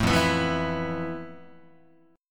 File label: F Major 7th Flat 5th, Guitar; strummed; JGuitar